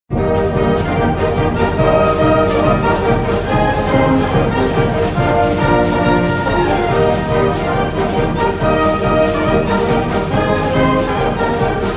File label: Fight Song; alumni; care package